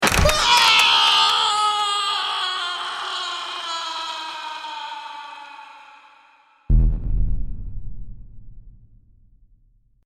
Trap Door Fall, Male screams as he falls through a trap door ending with an impact thud.